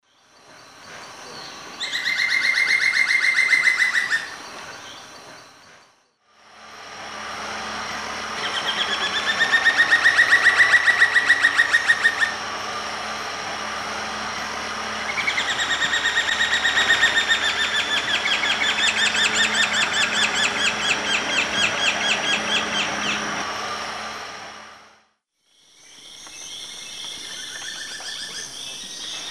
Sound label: Dendrocolaptes picumnus, songs pair; S. America; Eastern Lowlands